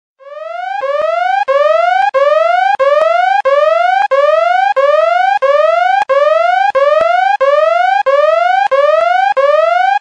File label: alarm